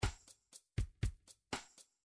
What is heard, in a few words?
drums ballad, midi